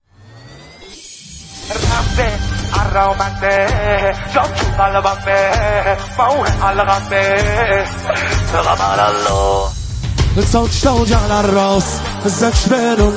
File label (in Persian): مداحی